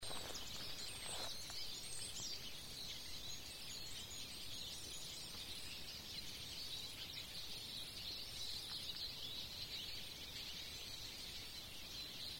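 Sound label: mahiwa call, Eurasian siskin; jinaki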